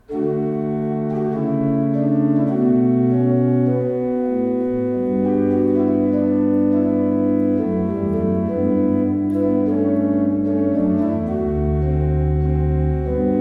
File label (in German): Bosch Raupach, gebrauchtorgel